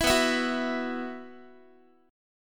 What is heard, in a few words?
B Suspended 4th, Guitar; Bsus4; strummed; 19,19,19,16,x,x